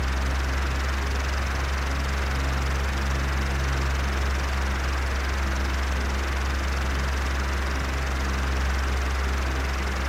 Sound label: electric fan high